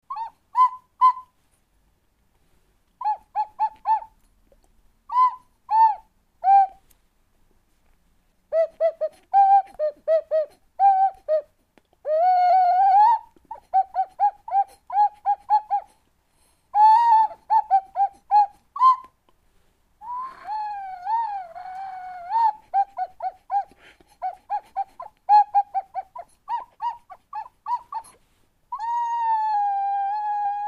OCARINA ARGENTIN

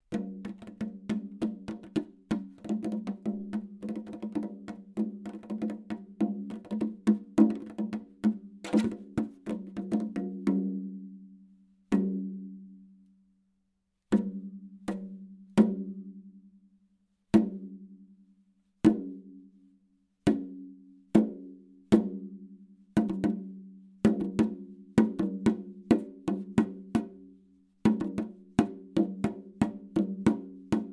PETIT TAMBOUR EXOTIQUE

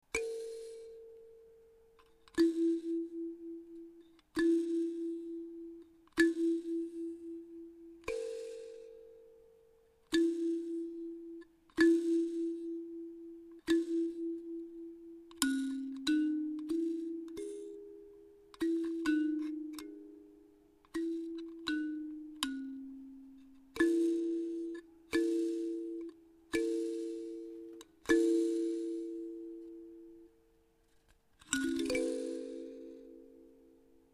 CALLEBASSE A LAMELLES AFRICAINE; LA KERMESSE DU COLLÈGE

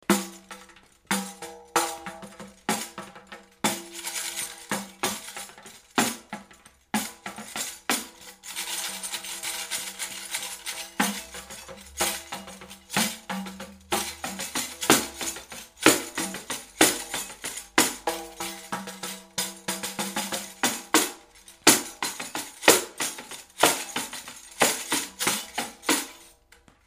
TAMBOURIN; LA KERMESSE DU COLLÈGE

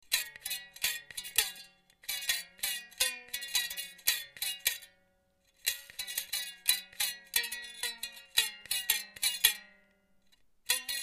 MINI BALALAIKA

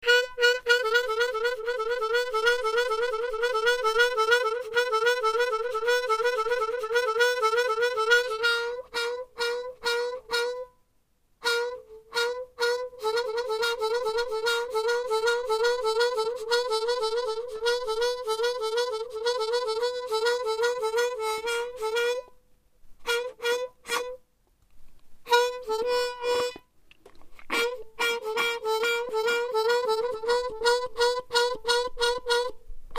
HARPE DE BOCHE; LA KERMESSE DU COLLÈGE

